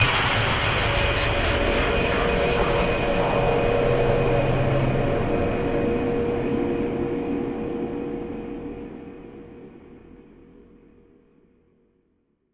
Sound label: Hit Metal